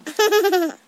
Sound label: nnnnnn